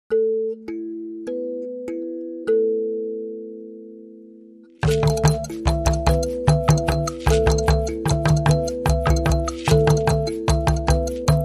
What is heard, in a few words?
Mbira Speaks